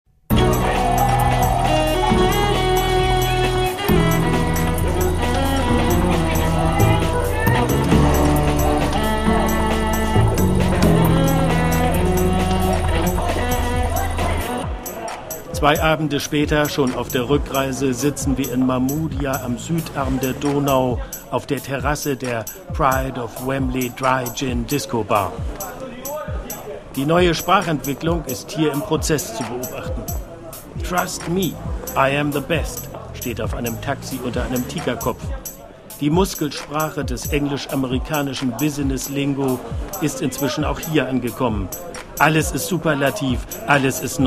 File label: taxi water real